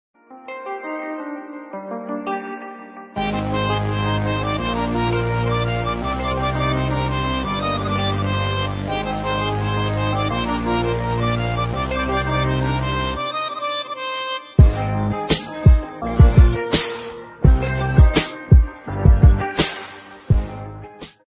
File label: new song bgm ringtone